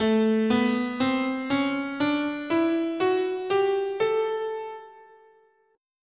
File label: A Bebop Minor, scale